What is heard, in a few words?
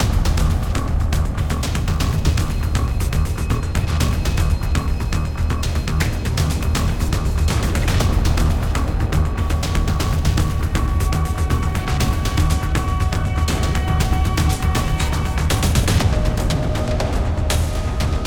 Combat Music; XCOM Enemy Within